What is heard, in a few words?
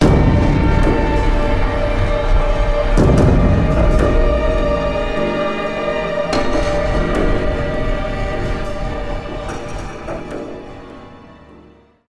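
suspense stealth short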